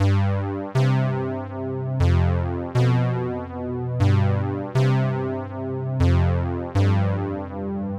JR-8 Mello Synth

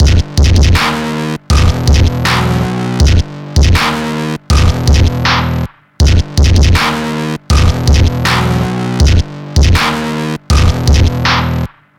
Hip Hop Beat

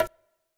Bongo Conga Hit

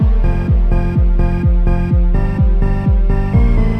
Layered Deep Trance

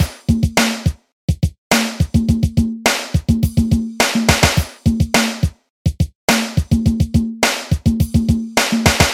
HipHop Beat

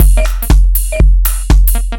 Modern House 120

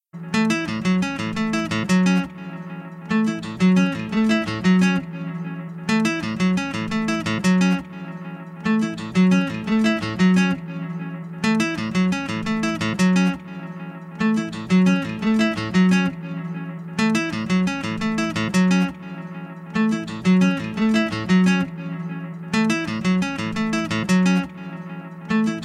Guitar Licks

